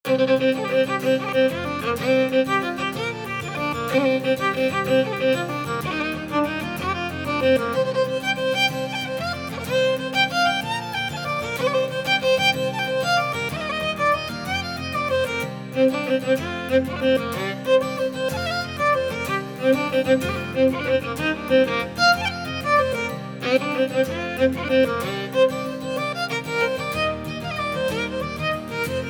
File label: Jig; Morrisons Jig